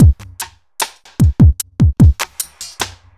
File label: evil beats